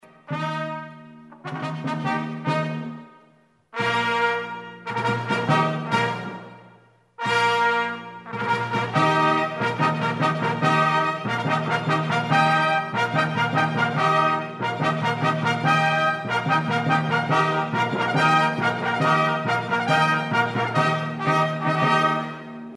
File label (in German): fanfare